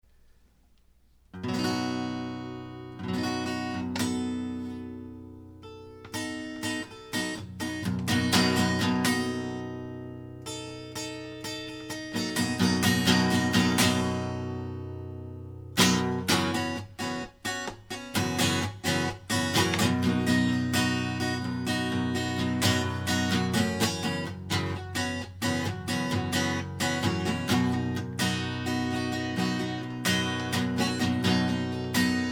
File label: Capo 2 A Instrumental